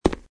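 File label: footstep concrete run; Casanova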